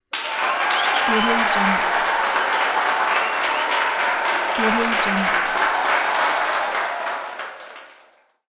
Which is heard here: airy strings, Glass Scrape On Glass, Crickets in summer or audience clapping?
audience clapping